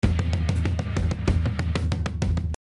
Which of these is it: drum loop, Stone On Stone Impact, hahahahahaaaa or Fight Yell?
drum loop